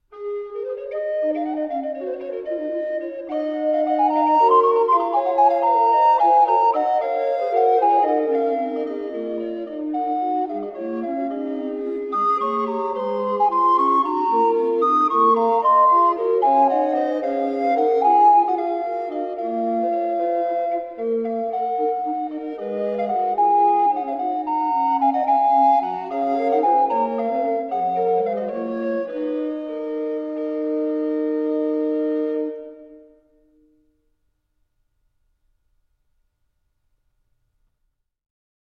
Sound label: Recorder Quartet; Ludwig Senfl